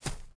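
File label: grass run right, footsteps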